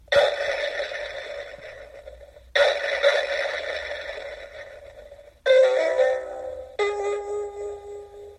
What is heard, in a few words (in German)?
schlagen